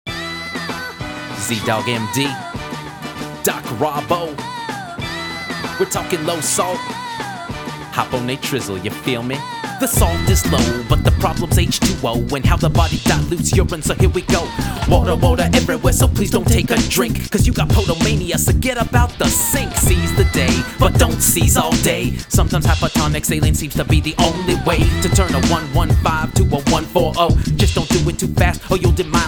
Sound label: Salt Rap